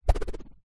foley stick plastic swipe swing through air whip wobble; zapsplat